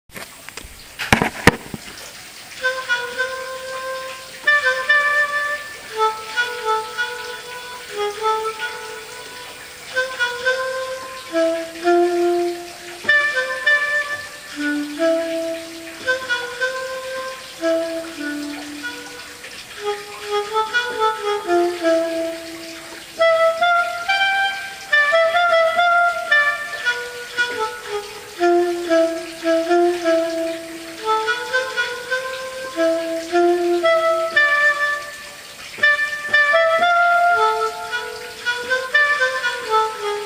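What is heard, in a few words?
pensive saxophone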